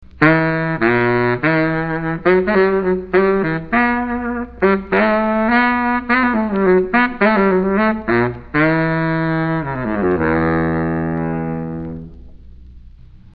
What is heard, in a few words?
saxophone baritone, woodwind; woodwind sound clips